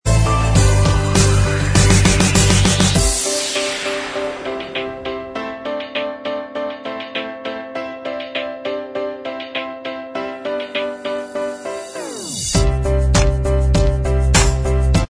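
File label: tv theme Instrumental Track, backing tracks; liv maddie tv theme; the disney channel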